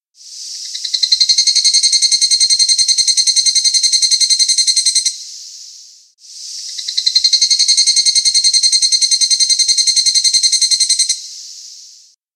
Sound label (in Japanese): koe higurasi